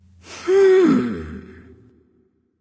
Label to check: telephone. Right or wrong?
Wrong